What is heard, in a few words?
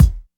small reverb bass drum one shot D# key; kick